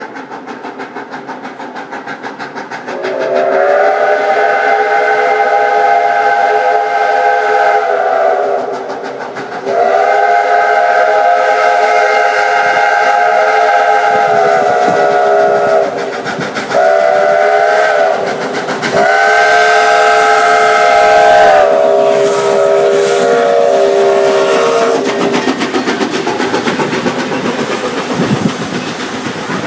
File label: Peanut Whistle Chime